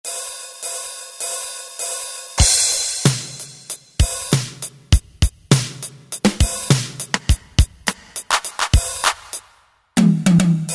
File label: STANDARD, Roland SC-50 Sound Canvas; Factory sounds